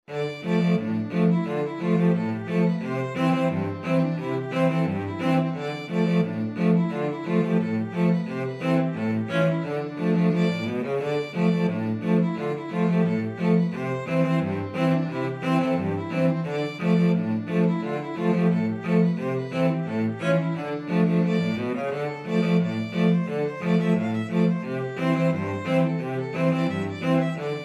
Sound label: violin cello, violin cello duet; the auld grey cat